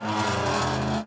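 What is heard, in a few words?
cauldron Arm Swing; objects; Star Wars Obi-Wan; XBOX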